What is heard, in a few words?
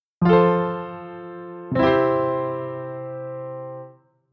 kadenz piano amen